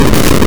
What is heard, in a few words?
Noise Bad Bits; Ableton Live 12 Lite; Vector; Sprites